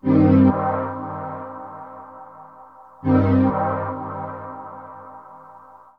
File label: synth pad ambient 160 C; Sphere